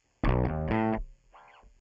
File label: wet bass